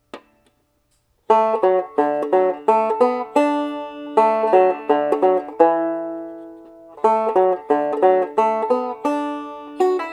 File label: melody; Soldier's Joy